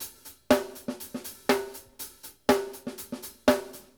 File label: HANDS